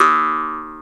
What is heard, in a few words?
Jaw Harp Uu; Multisamples; Digital Sound Factory Planet Earth